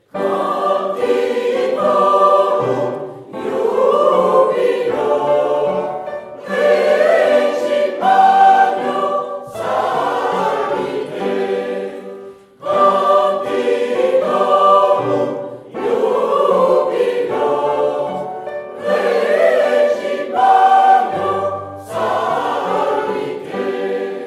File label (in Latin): canticorum tutti